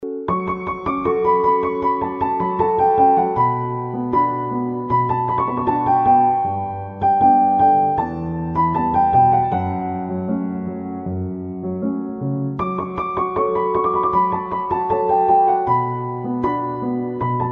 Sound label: Mobile ringtone